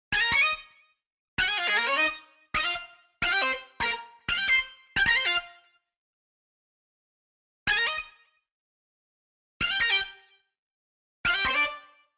hi syn

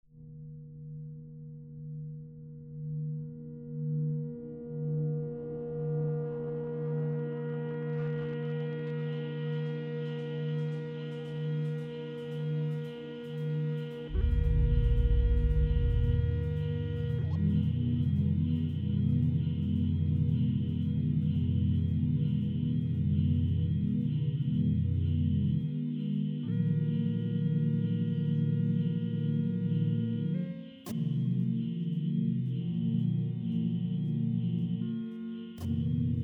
ambition drone

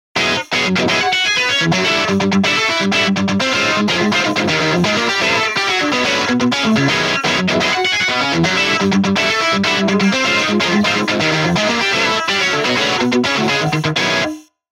gtr

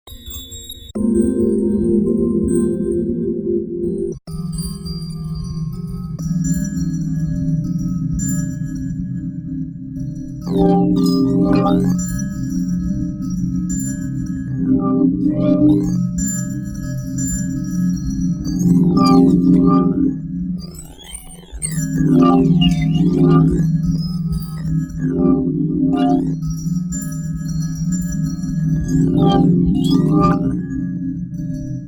metal; realblade

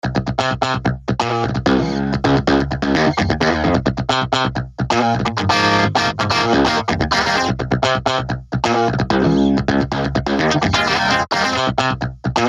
Gtr

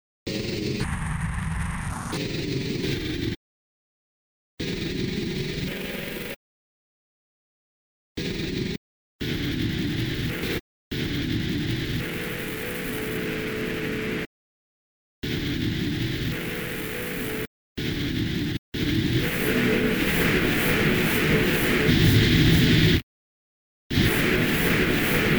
gung loop

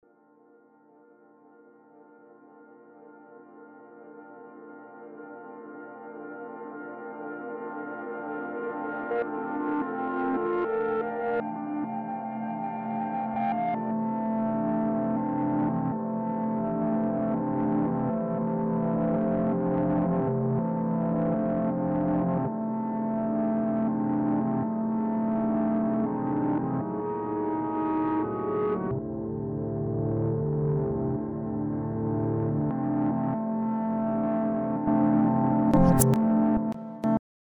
ash dark

